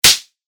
ひっぱたく ビンタ 叩く; モノラル; 武器効果音

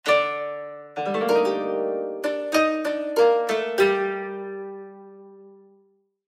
琴の アイキャッチ; 商用利用可能な無料のアイキャッチの楽曲です

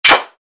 鍵を開ける

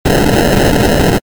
ゴゴッ 動かす レトロ 長 モノラル

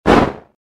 モンスターの羽ばたき 1回

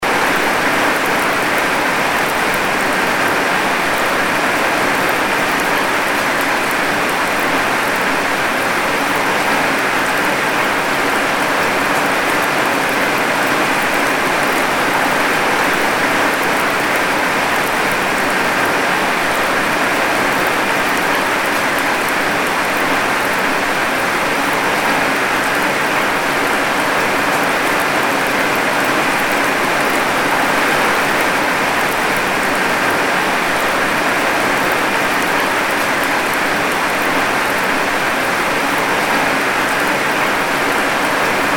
雨 その2; 環境音

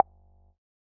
ポン コミカル

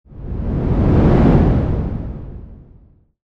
風の音

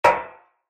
足音 金属 単音 二歩目; 足音の効果音

